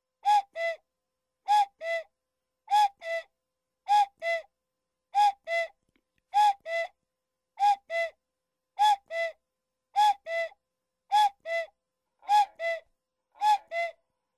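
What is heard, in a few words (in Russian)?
часы кукушка; Chasy kukushka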